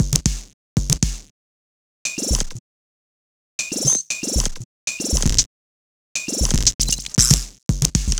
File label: Percussive Bass; Sonic Foundry (Sony Creative Software); Modular Electronica; Basses